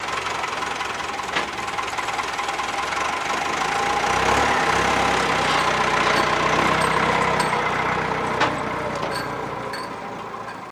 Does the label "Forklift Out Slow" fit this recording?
Yes